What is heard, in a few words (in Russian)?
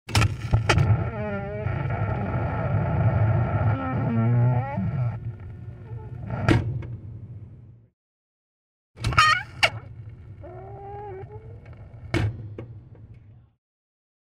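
skrip metallicheskoj dveri, metal door creak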